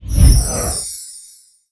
familiar fairy jump; DunDef

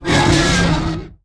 wyvern scratch attack; SFXEnemies; Dungeon Defender; DunDef

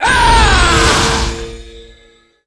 Circle Slice; Player; Squire; Dungeon Defender; DunDef

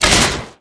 Arrow Shoot; Player; Huntress; Dungeon Defender; DunDef

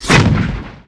Bowling ball Shot; Defenses; Tower; Dungeon Defender; DunDef

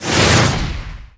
Magic Staff Fire; DunDef

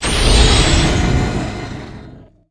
Crystal Activate; DunDef

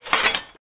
Squire shield raise; Player; Dungeon Defender; DunDef

